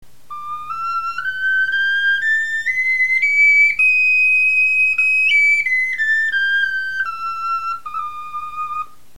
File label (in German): tonleiter, knochenfloeten